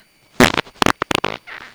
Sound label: fart